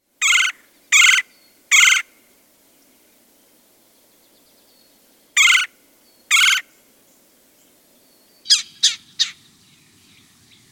Red-bellied Woodpeckers; Birds

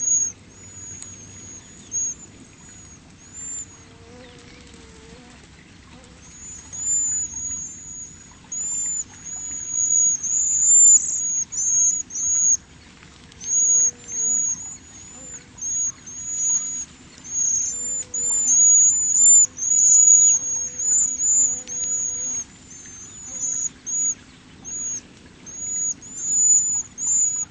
Cedar Waxwing, Birds

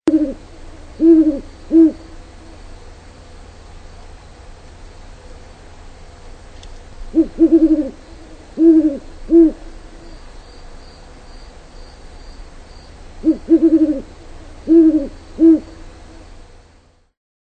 Great Horned Owl; Birds

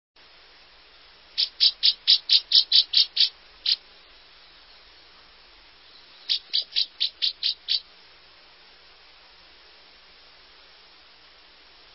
Northern Rough-winged Swallow; Birds

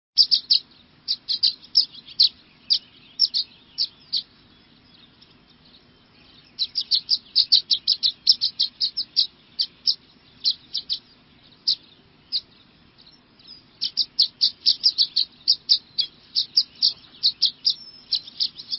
Violet-green Swallow; Birds

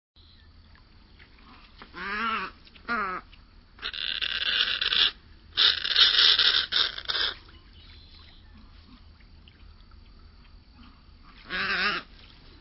Snowy Egret; Birds

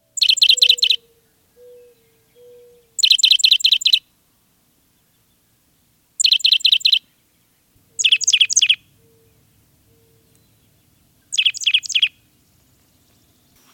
Oak Titmouse, Birds

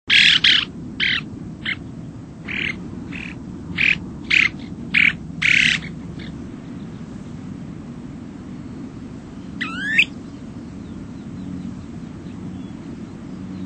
Snowy Plover; Birds

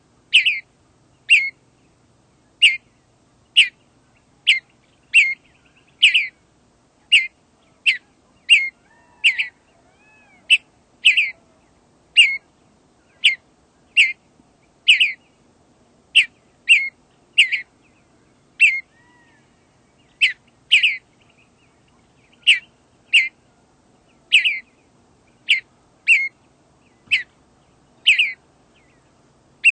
Western Bluebird; Birds

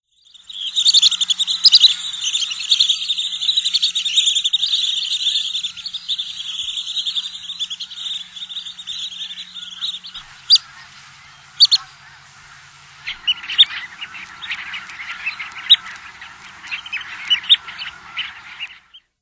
Long-billed Dowitcher; Birds

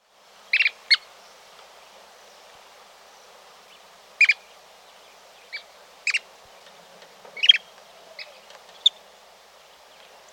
Wilson's Plover, Birds

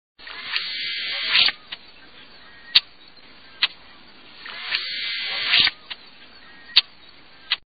Brewer's Blackbird; Birds

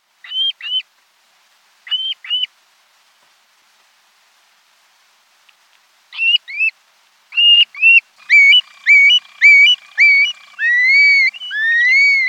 Long-billed Curlew; Birds

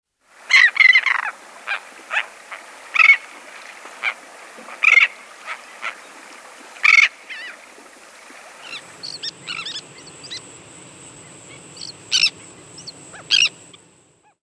Royal Tern; Birds

